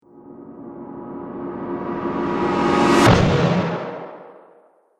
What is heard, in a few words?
Trailer Big Bang Riser; Sonic Logo